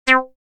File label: Shorty, synth lead